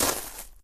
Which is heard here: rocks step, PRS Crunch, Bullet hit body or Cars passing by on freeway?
rocks step